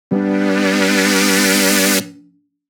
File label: Analog Lets Rise; jup8